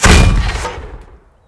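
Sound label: grenade launcher fire; weapons; quake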